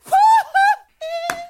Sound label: Ho Ho Hee